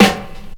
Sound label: Snare, Drum&Bass; 90 s Sample CDs; Total Drum&Bass